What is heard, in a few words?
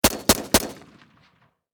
Warfare Machine Gun 5.6 Caliber Sig Sg 552 Commando Triple Shot Distant Perspective